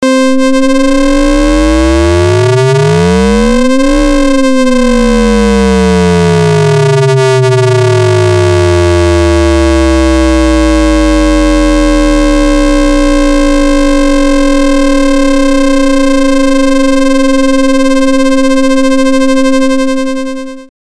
sideband

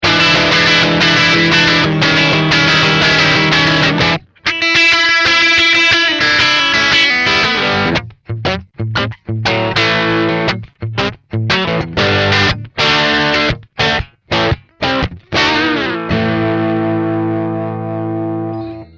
Bridge Humbucker Crunch

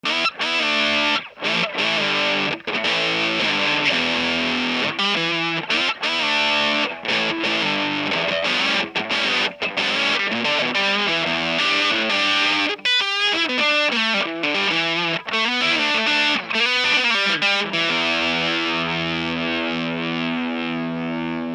full Gain Tone; Way Huge

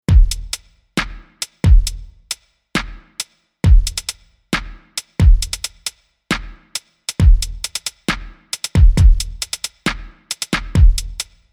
Beat

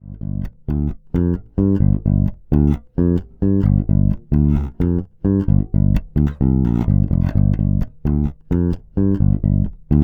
Both Pickups; MTD 535

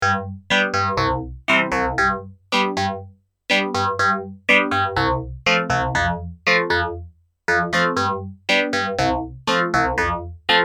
Reface CS FM

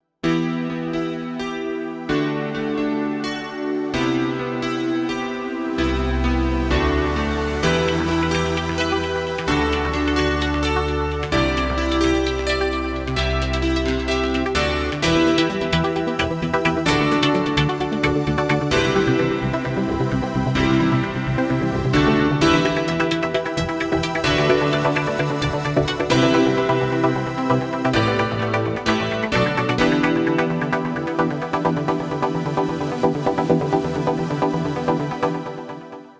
Montage

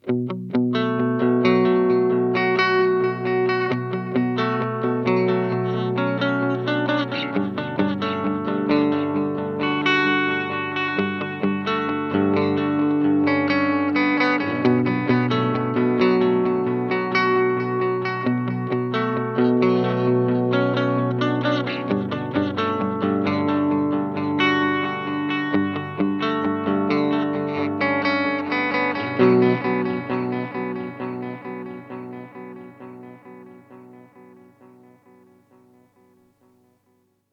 Boss SDE 3000D pan

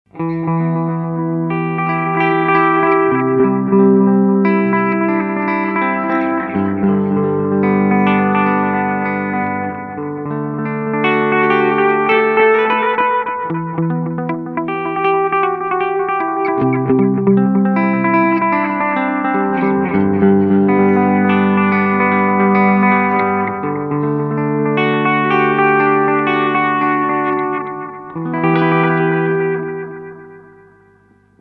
Meris LVX Modular Delay System Dark Buckets

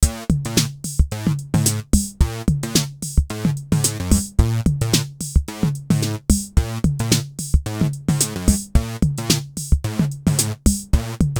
E Beat EQ

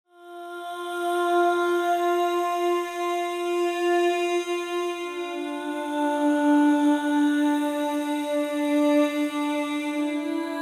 Vocalise Sustained Vowels

